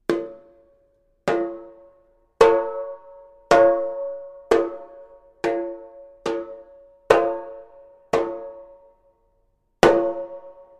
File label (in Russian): buben